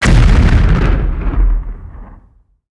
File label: Explo Grenade Incendiary; Destruction